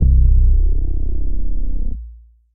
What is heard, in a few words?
Elektrolyte Bass; Ableton; Factory Packs; Chop and Swing